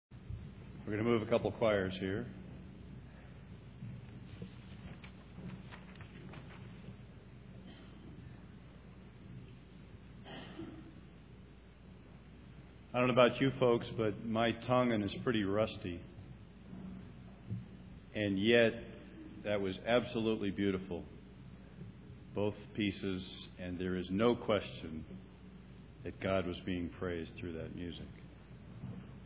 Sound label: Ghana Choir; World Communion